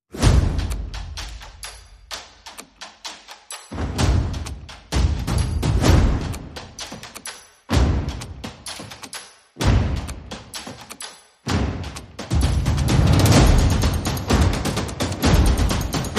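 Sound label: Oh Hey Fiesta, Percussion Only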